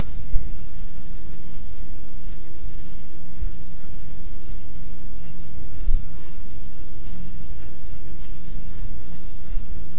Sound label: sad hum sound brief low